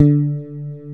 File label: D-3, FRANGE BASS; AKAI S-Series CD-ROM Sound Library VOL-7